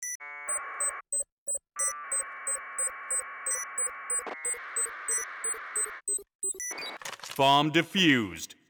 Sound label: defuse; pbb